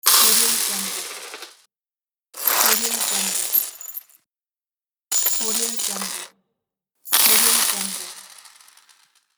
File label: falling nails